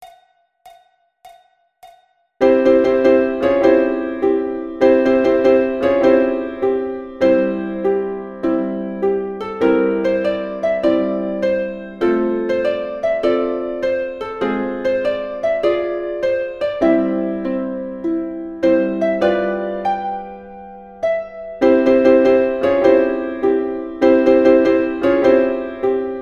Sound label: Ukulele